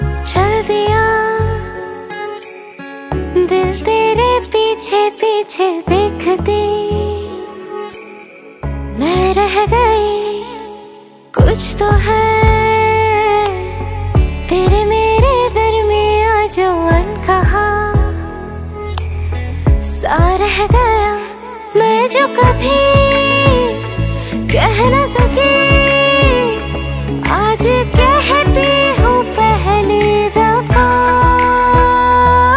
Female Version Ringtone

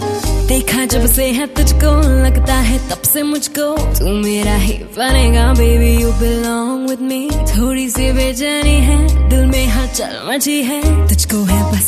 Romantic Hindi Song Ringtone

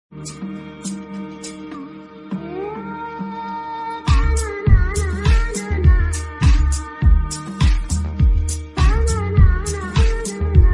BGM Ringtone

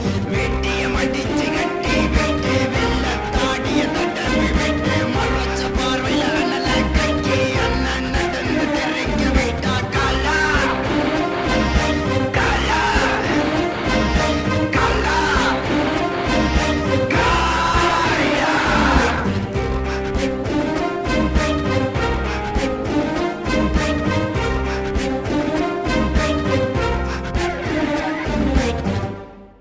Title Song Ringtone; Kaala

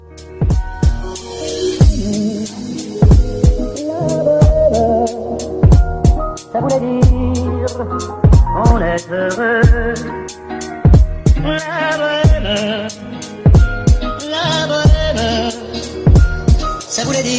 Heart Touching English Song Ringtone; ringtones

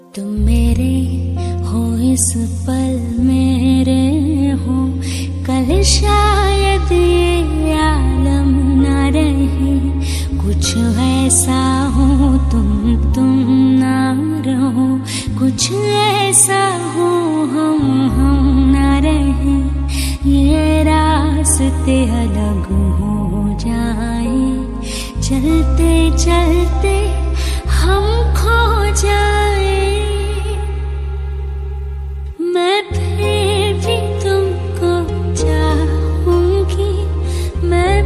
Female Ringtone

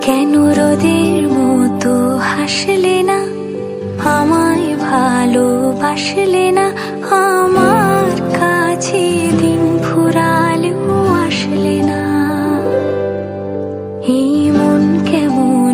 Bengali Song Ringtone

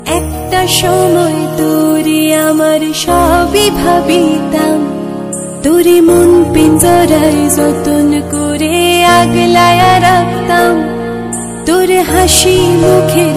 Female Version Sad Bengali Ringtone

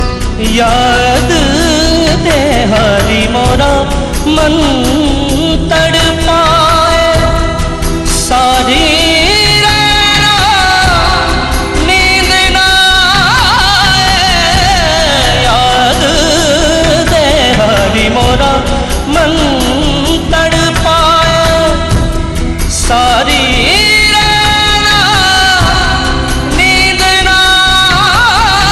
Heart Touching Hindi Sad Song Ringtone

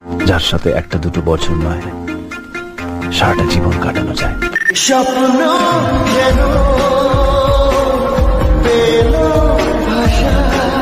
Bengali Ringtone

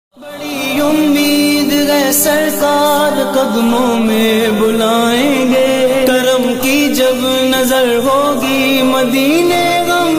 Naat Ringtone

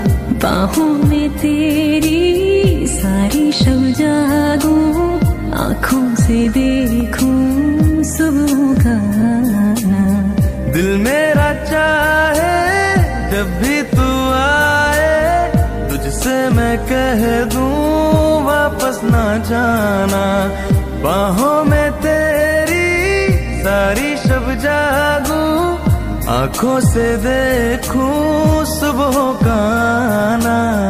Female Version

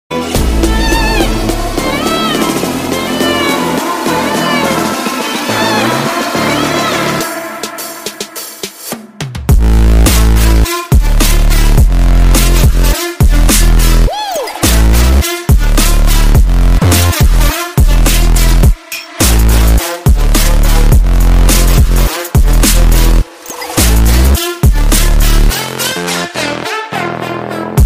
Trending Music Ringtone

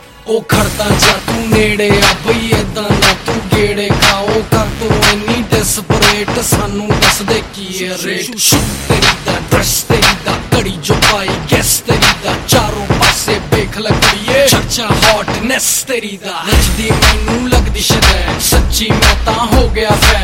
Punjabi Remix Ringtone; ringtones

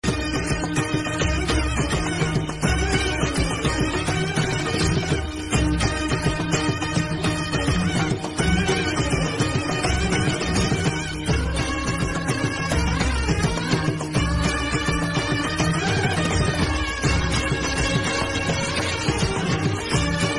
Murugan Devotional Bgm Ringtone; Padayappa

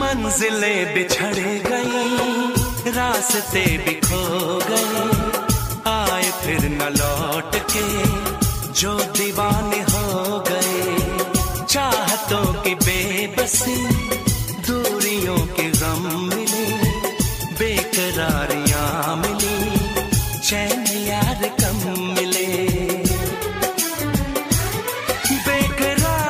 Old Hindi Song

